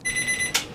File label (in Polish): dzwonek